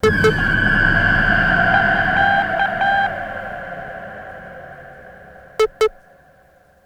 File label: Space; Electronic Point Blank